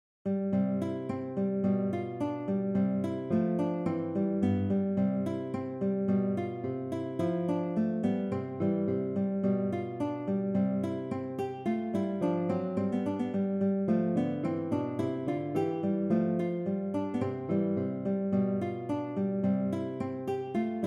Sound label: Andante, konzertgitarre